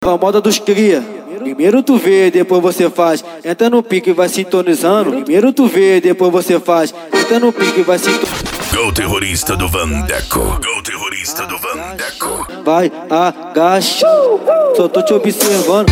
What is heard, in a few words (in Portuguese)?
dance e funk mega bass